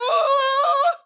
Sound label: AHurt; Morty